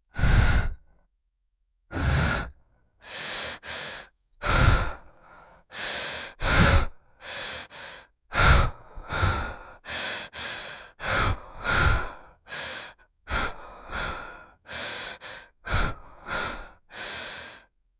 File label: obese heavy woman breathe